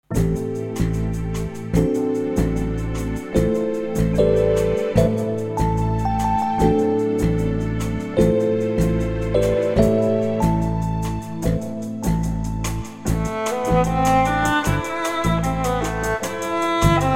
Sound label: nigun, new arrangement, akystik; baal-sulam